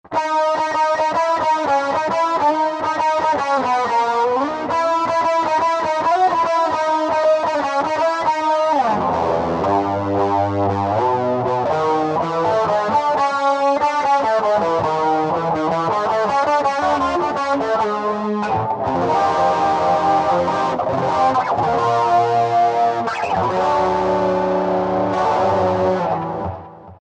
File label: FUSION, Digitech RP200 Factory Presets